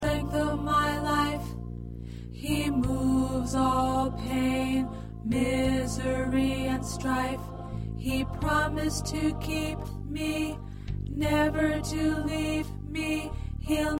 God Is Alto

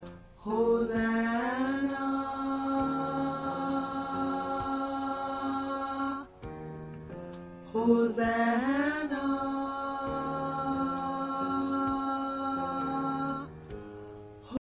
Alto, choir parts

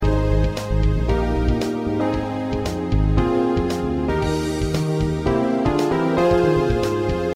Accompaniment

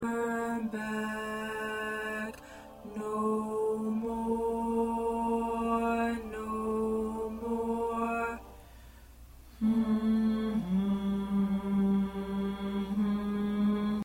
1st Tenor

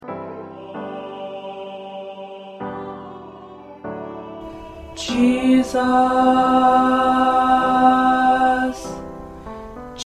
Tenor, choir

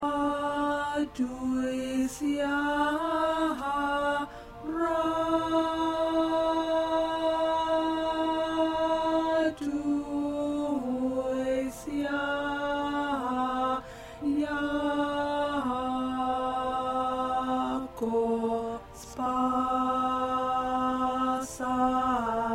Tenor 2; sacred classical pieces

